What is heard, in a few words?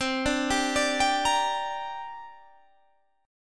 C 7th Suspended 2nd C down Guitar Standard; C7sus2 arpeggiated